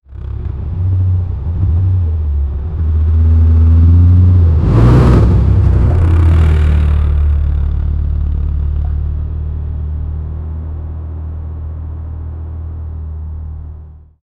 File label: 1990s Toyota Land Cruiser